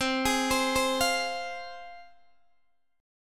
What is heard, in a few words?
C Suspended 4th Sharp 5th, C, down, Guitar, DADGAD